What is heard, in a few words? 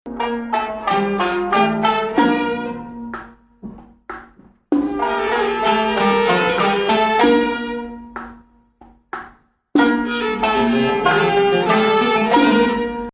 Dalansheen Ijma3 Shufna 2nd Rast